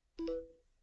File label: Phone Ping